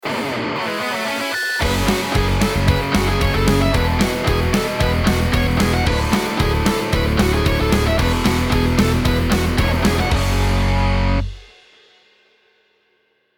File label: hard rock logo intro